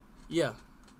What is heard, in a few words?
yeaaaaaa